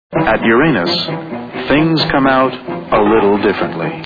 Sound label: fart sounds